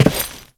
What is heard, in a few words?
Step Default, Footsteps